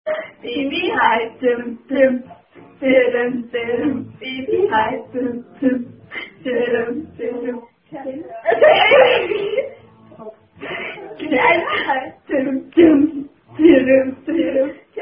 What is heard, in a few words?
German Attack Song